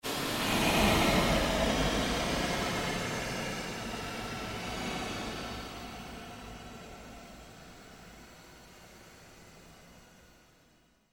Created Surge Long Sweep, Sweeper Sound Effects; Sound effects created by manipulating other sounds

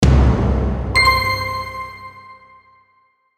Logo Stab Low Drum Bells Reverb; Sonic Logo; Blastwave

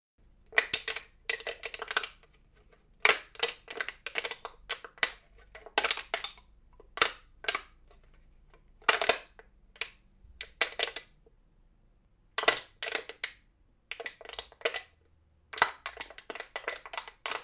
Aluminium Can Various Crunches; Crunching and crinkling a beer can, soda can or other metal can.; Crunch Sound Effects; Lynne Music

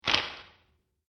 Bone Break, injury; combat

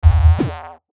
static low buzz; Static Low Buzz Hit; Production Element Imaging Element Accent Transition; Blastwave; Static Sound Effects

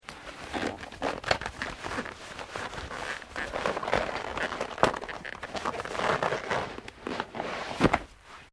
SKI BOOT REMOVE; Snow Skiing; Skiing and Snowboarding (Sound Effects:Sports)

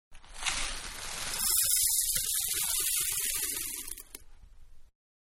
Cereal Into Bowl Medium; Pouring; Cereal-Into Bowl-Medium 2; Objects; Manhattan Production Music

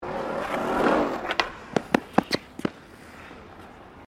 SKATEBOARD SKATE BOWL COME OFF; Skateboarding; Sports

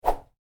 Woosh, Whoosh: Short, Light

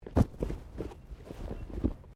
Body movement Tumble Light; Body movement foley to simulate falls and deaths; Rolling; Objects; SoundEffects-Foundation1

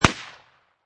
Pistol Remington Gp 38 Single Shot Designed; Gun / Pistol: Remington GP 38, Single shot, Designed

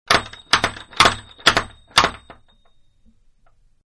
Knocker On Wood Door, Inside; Miscellaneous Doors (Sound Effects:Doors); Manhattan Production Music

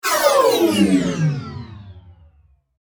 Science Fiction Spaceship Pass By Whoosh Flange; Spacecrafts

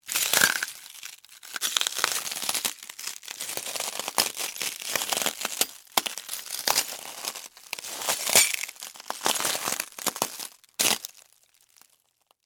Impact Glass Step, Footsteps, Broken, Shards; Glass (Sound Effects:Materials); BlastWave

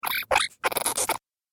Little Imp Chatter, monster sound effect; Little Imp Chatter 1; Horror; Andrew Fleming

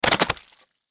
Impact Mud Drop Splatter Thick Liquid Slime Foley; Low Impacts; Blastwave

